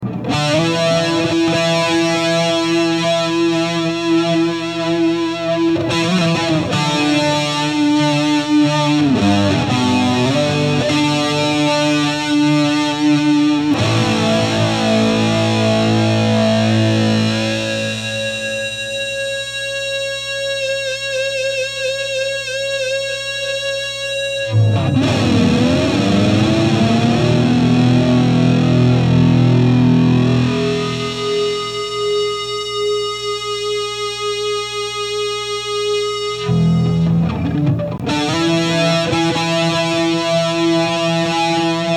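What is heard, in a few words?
Test, guitar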